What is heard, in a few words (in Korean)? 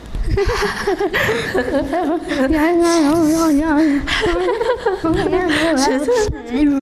웅성웅성